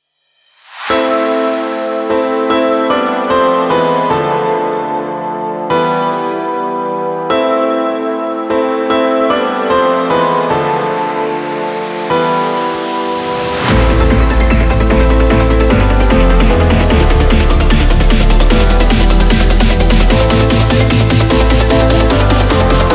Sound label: drums & bass